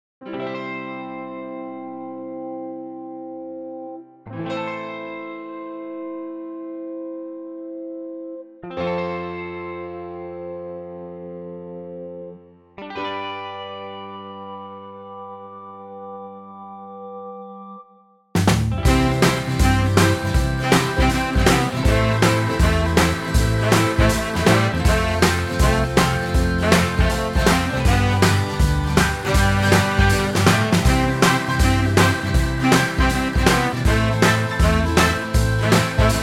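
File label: C, backing track; key - C - vocal range - G to G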